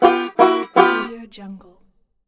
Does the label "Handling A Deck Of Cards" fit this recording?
No